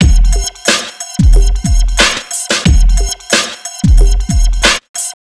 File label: Heavy Weight, Drums Beats